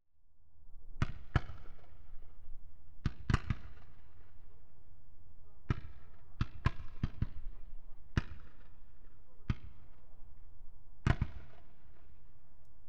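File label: Warfare, 81mm Medium Mortar Firing; BBC Sound Effects Library; Explosions Guns Alarms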